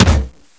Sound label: land; cstrike